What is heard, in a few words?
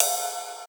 Ride Cymbal